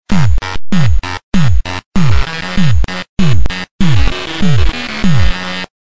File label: reactor loop